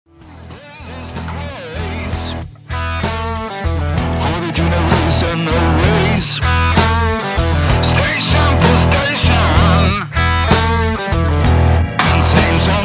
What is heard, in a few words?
blues